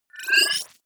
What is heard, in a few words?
wobble rise short